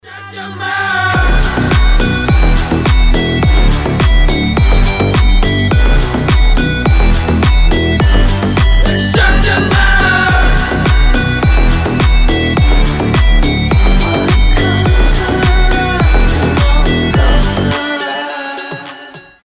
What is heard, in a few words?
organ